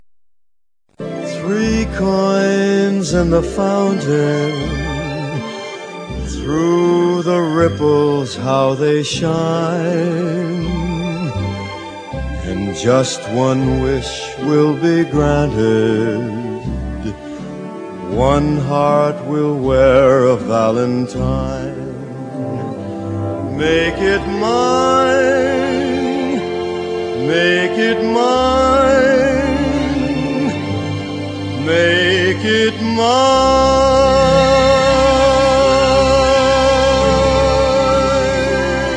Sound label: coins